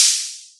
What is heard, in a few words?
Boss DR-202 hat